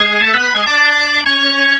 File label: Organ Lick; Ambient Grooves; Organs Licks